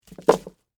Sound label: Rope Drop Wood, Surface, Heavy, Rope; BlastWave